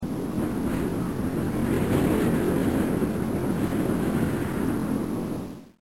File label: WIND